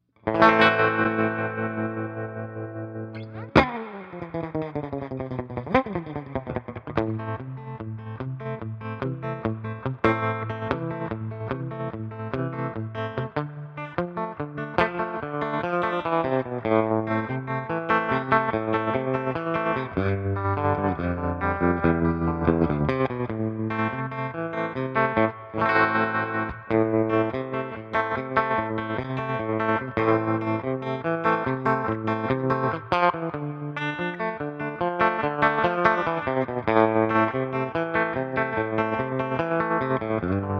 Tremolo Old Western morph into chopper rock telecaster into 6V6 Princeton; Terrain Tremolo